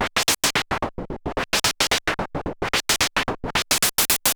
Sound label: MiniBrute 110BPM, Gated Seq Noise